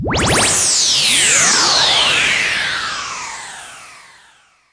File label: Electro FX; Ueb HE Electro FX